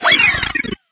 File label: Level complete; Deadlings